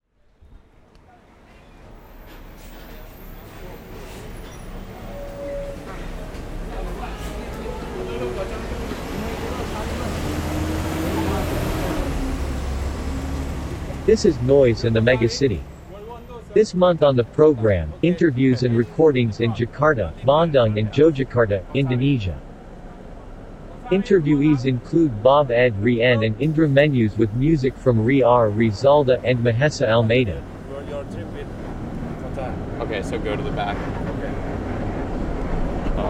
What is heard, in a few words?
Jakarta Bandung Jogjakarta Noise; Noise and The Megacity; Paulus Van Horne; Wave Farm